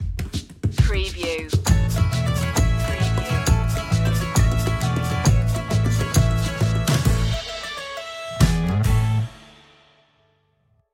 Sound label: old irish tavern stinger